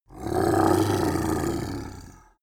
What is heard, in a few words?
monster dark growl bubbling; kreaturen